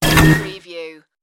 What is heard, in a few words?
spaceship door close